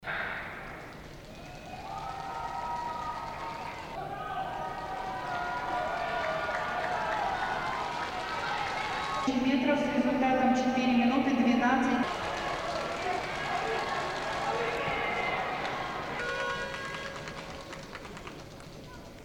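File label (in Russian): обрывочные кусочки фона на стадионе соревнований по бегу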